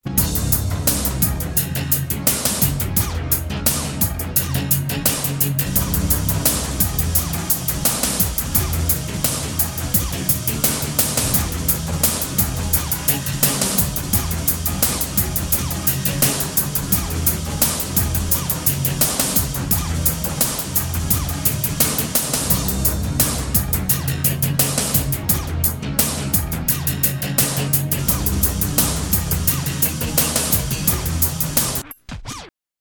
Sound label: beat